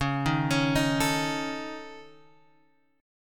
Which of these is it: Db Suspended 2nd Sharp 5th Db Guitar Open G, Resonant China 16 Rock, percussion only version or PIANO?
Db Suspended 2nd Sharp 5th Db Guitar Open G